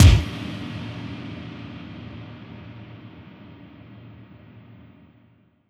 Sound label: FX Impact